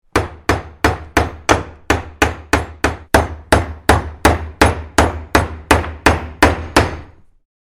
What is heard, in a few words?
Worksite ambience hammering noise